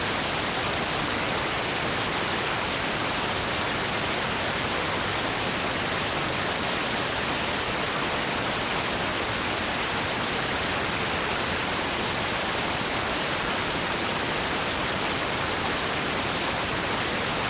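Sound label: radioteletype receive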